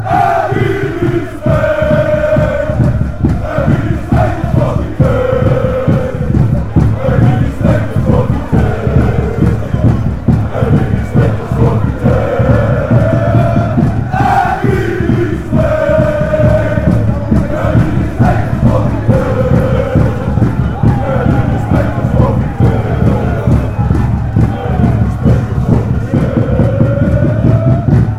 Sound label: de graafschap chant